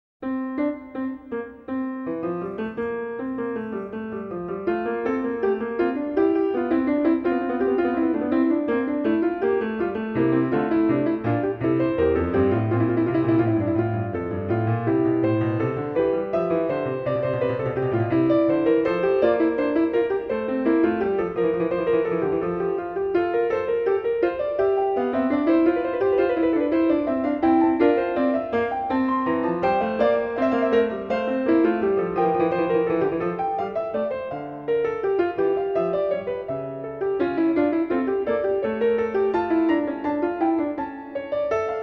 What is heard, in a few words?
Fugue in F Major; BWV 856; BACH; WTC I